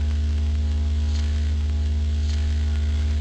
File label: Hum; zps; Ambient; Hums